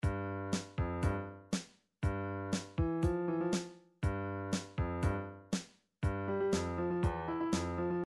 funk, midi

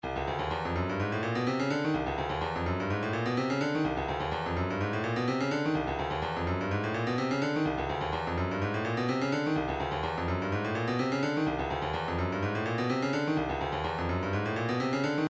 rotating drum; 126 Dirvish

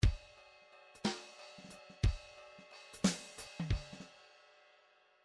midi file fills 3 4 fill

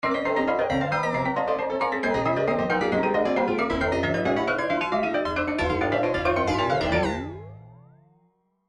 trance midi loops; newtronic trance constuction kit

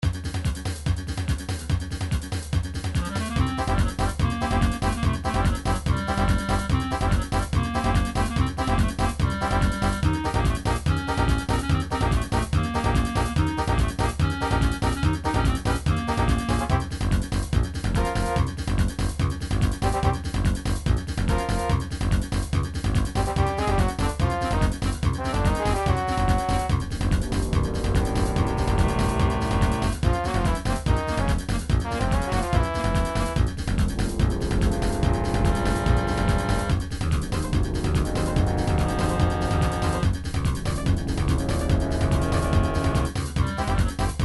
big boss battle; Game System Super Nintendo; Super Mario RPG; XG version

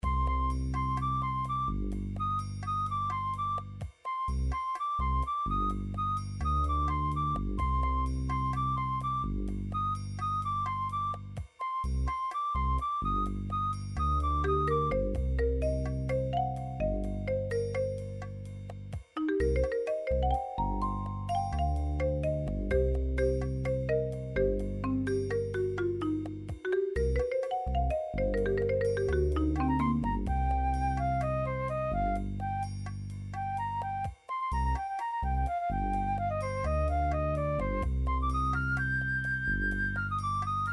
elevator music; nintendo 64 goldeneye 007

